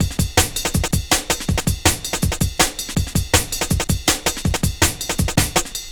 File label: Combination 162, Drum Loops, Jungle; Jungle Warfare 1&2